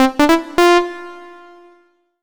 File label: MUSIC EFFECT Solo Clean Sawtooth Positive; startide macos switch windows gamerip 2017